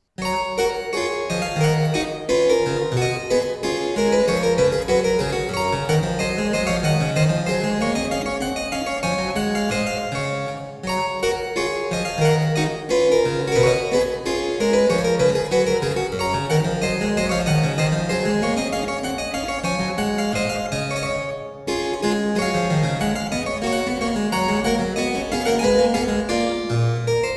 French Suite No. 5 in G major, BWV 816 - IV. Gavotte; Bach; The French Suites